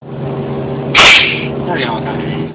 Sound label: Crash Cymbal